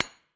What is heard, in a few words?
manual repair simple; factorio 2.0.55